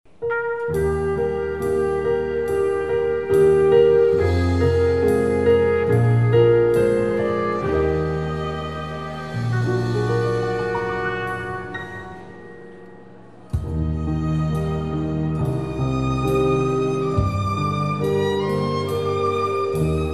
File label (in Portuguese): Piano e Cordas